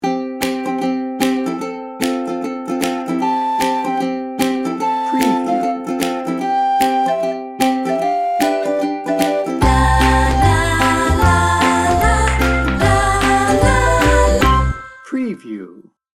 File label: ukulele kids; cheery; 15 second